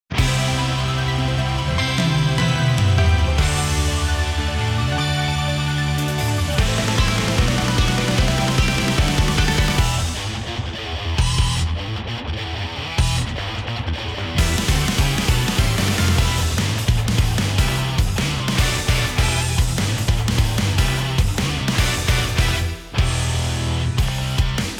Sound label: inst